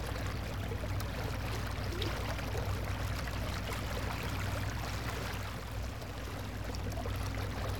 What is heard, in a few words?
water static; ott3d